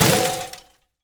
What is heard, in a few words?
Simple Gravity Switch FX Am6(9); Video Game; Cymatics; Video Game Sample Pack Vol 1